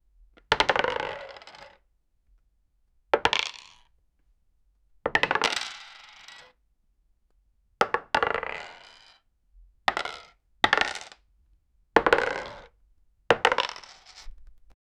wooden dice scatter acros; gameplay

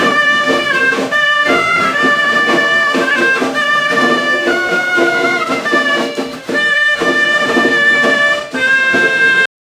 traditional chinese music

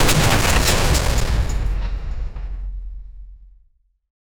upon impact a massive; destruction

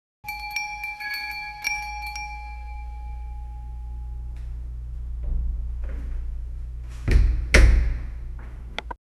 door creaking open; atmosphere

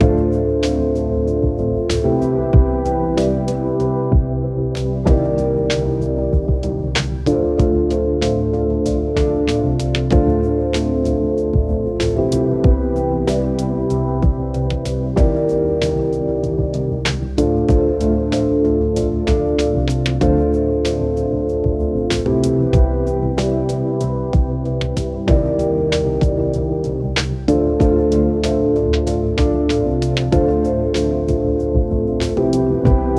lo-fi funk 95 bpm; 40 sec

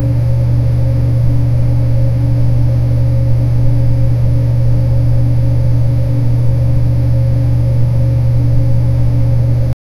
low bassy hum of endless

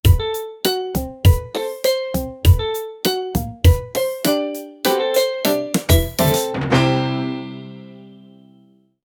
funny prank music, prank

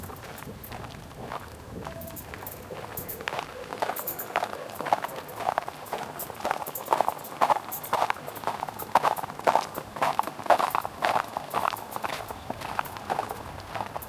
camels walking in the des

